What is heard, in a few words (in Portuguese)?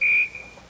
apito; chaves